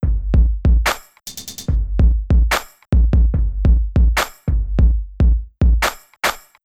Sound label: drum luup